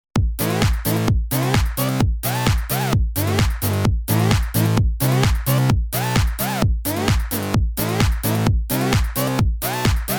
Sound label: EDM